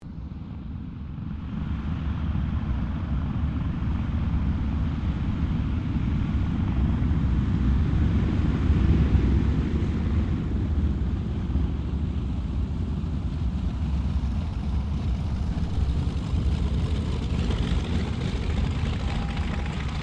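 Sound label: AIRCRAFT LOCKHEED L-1049 SUPER CONSTELLATION TAXI; 1955; Connie, Super Constellation; Airplanes (Sound Effects:Vehicles)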